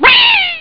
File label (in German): gegner cry; ST-XX Amiga Soundtracker Sample Packs